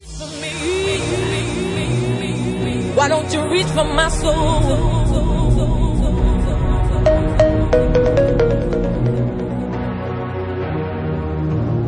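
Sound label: Organ Remix, Bassline House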